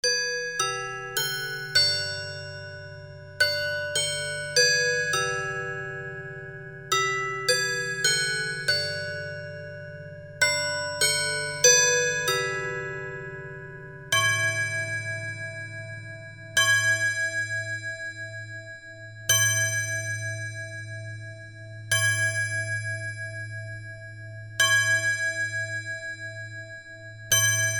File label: BELLS